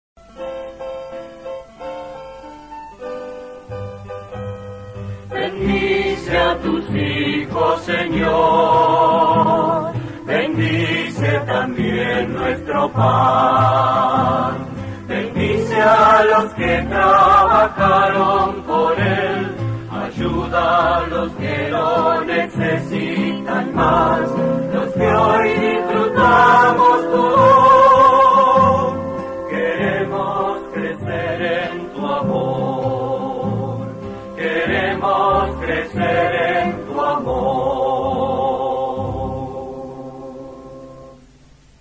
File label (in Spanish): Canto De Bendicion Y Alabanza